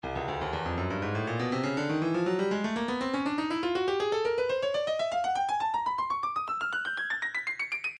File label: breakbeat midi files 120 121 bpm 121; Microbrew E; Stylus Breakbeat Midi Files